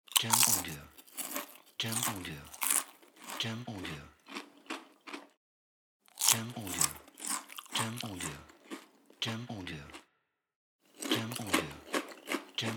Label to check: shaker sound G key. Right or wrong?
Wrong